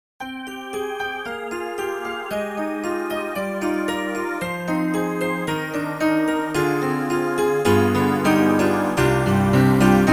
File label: Wave Stack Piano